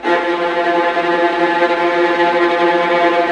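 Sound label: VIOLINT G3, Synclavier Strings; ILIO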